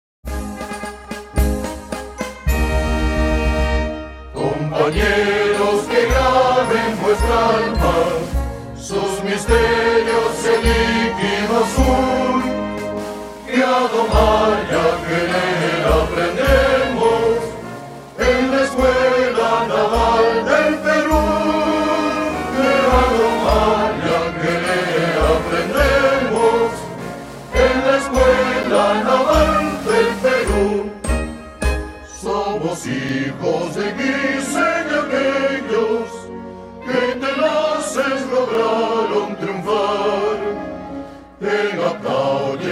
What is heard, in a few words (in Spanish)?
himno de la escuela naval; Himnos